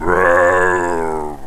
moan loop; shamblie; npc

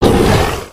hit, creature; stalker

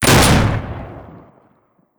shotgun dbl fire; doom; npc

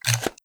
super shotgun shell insert deep; weapons; doom; ssg

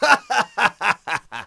laff; deathlegends

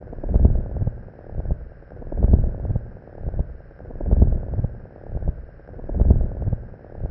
hbeat; deathlegends

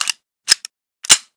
reload, weapons

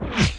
bullet L to R slomo, near miss; weapons

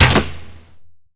bow end; deathlegends

